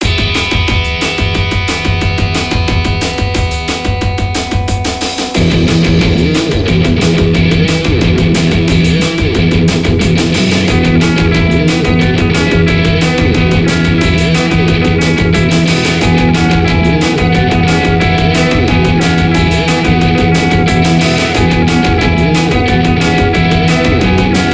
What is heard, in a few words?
pentatonic scale; i pooped pentatonic scale and called it a song